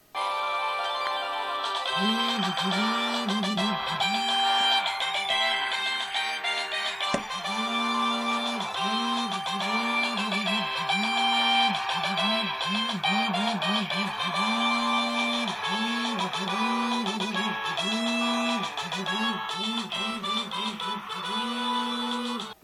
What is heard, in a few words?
samsung sghe700 ringtone